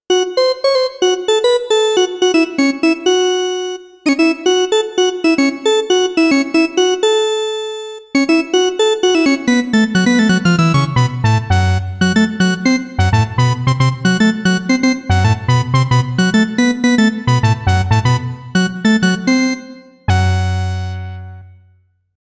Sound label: Lead Bass